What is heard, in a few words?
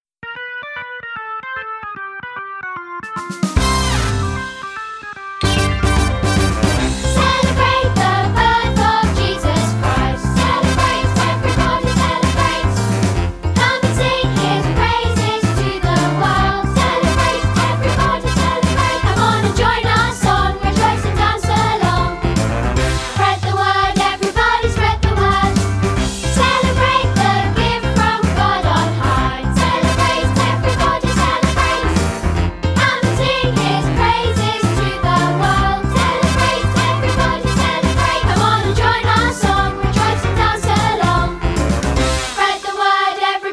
Vocal